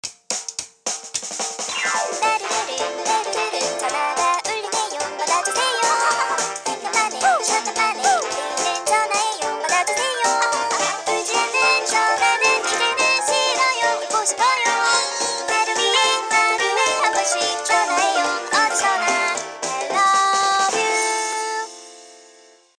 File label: beep song, ringtones; Samsung Galaxy Tab SHW-M180S